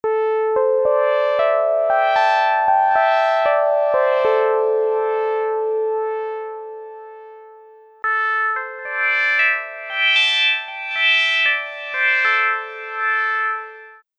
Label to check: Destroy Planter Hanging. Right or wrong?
Wrong